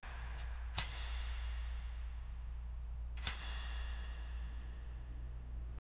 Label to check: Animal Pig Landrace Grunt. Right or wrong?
Wrong